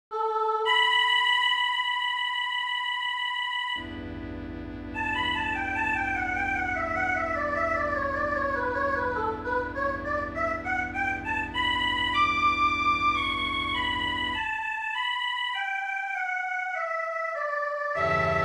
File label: bass; 17 ave formosissimo; carmina